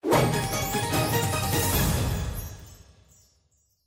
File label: Free Spin Entering Bonus W Firework Display And Animation; slots; Miles And Millions